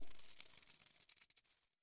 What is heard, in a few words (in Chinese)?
受伤传导声; 嘎枝之心